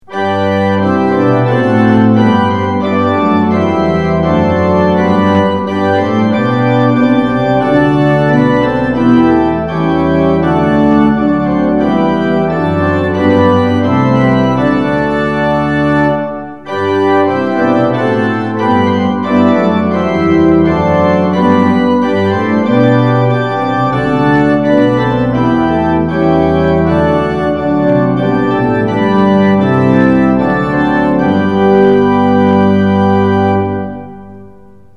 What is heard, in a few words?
tune; hymns; lillenas